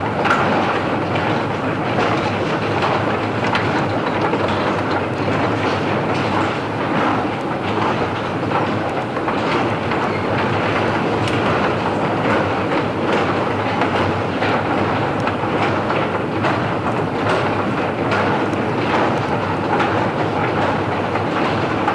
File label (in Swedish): rulltrappa